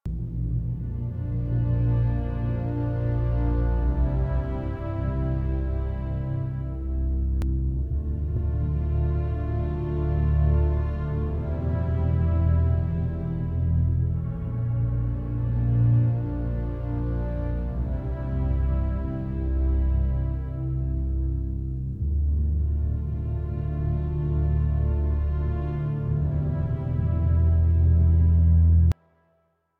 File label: Intro Cue Loop, Music; Dynamic Music; 1999; Lotus Eater